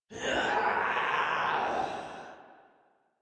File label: Death; Warframe; Leekter; en